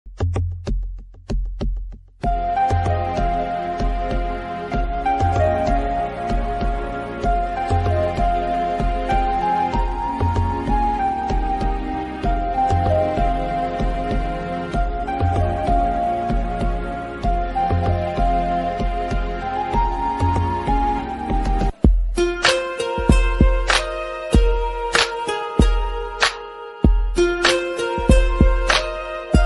bgm ringtone bgm tamil sad bgm

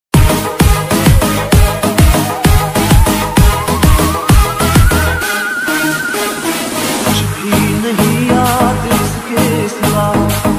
free fire ringtone shotgun ringtone

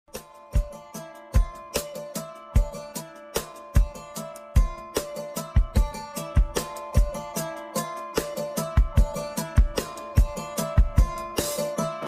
pakistani drama background music

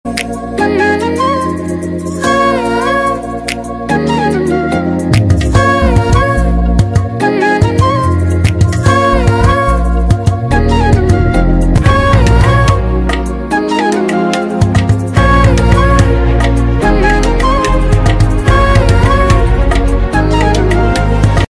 love bgm ringtone; lubber pandhu

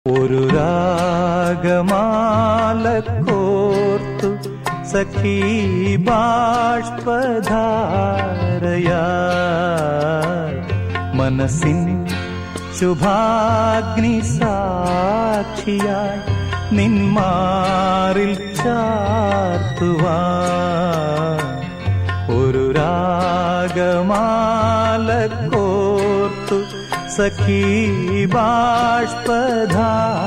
old malayalam melody songs

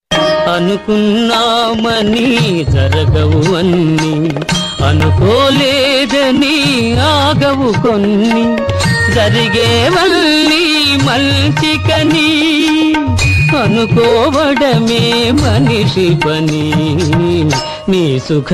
old song

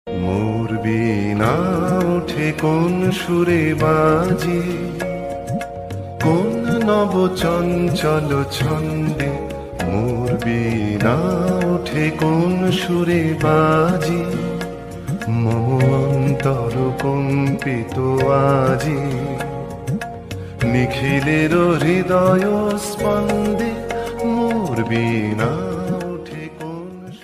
rabindra sangeet bengali ringtone; new bangla adhunik ringtone

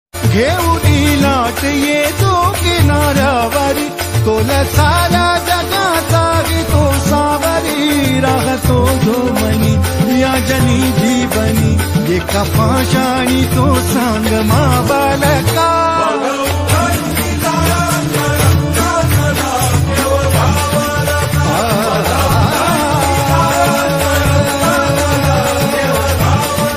Vitthal ringtone lofi remake

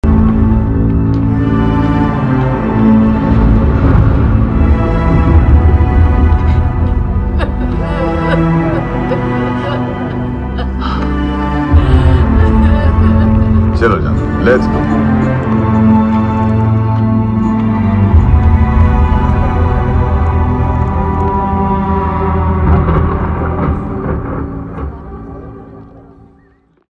sad bgm ringtone emotional bgm ringtone; Shaitaan movie; Ajay Devgan

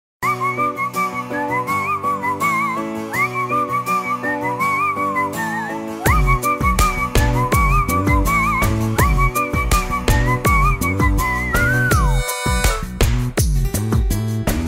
love ringtone, Tamil ringtone

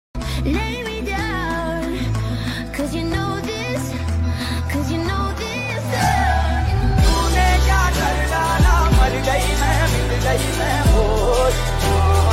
English song

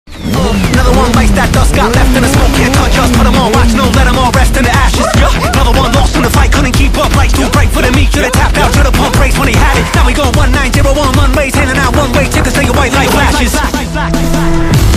car chase bgm; mammootty

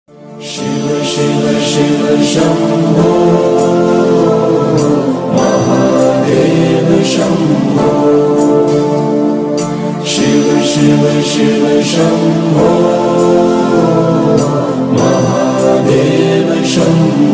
mahadev ringtone lofi bhakti; shiv shiv shambhu

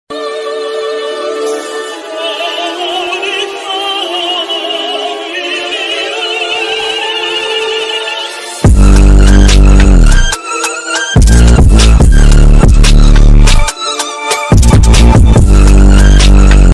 attitude ringtone english ringtone

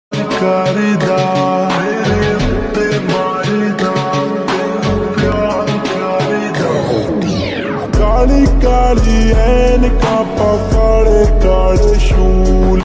lofi ringtone

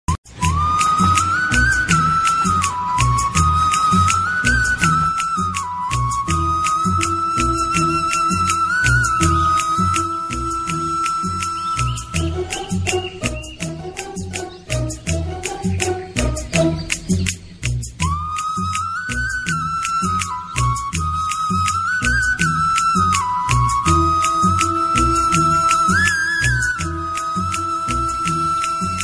ringtone bgm

